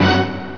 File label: ORCHEST